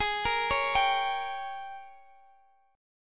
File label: Ab Major 7th Suspended 2nd Suspended 4th, Guitar, DADGAD